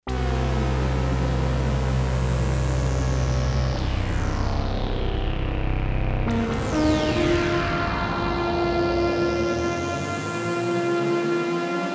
Double POLYPHONIC PORTAMENTO COMP SYNTH, Oberheim OB-8; Splits & Doubles